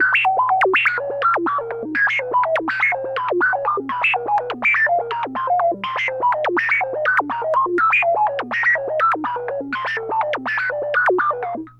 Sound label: NOISE, SYNTH SEQ; AMG; Global Trance Mission VOL-2